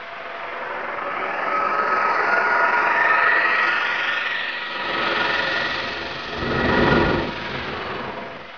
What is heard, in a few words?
dome energy rush; environ; Gunlok